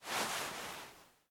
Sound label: Rubbing; Still Life; windows